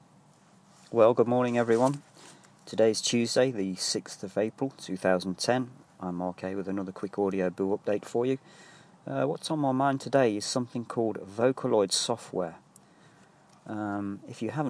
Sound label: vocaloid software